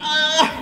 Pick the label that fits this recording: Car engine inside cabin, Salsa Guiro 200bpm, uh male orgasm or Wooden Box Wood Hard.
uh male orgasm